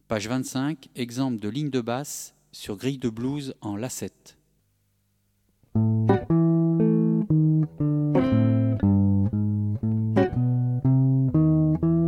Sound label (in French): Jazz accomp